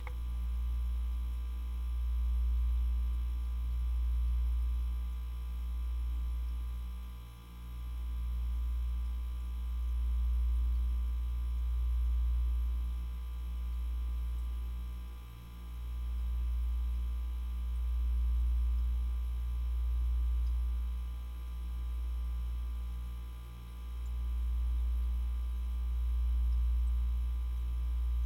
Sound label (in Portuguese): Motor Geladeira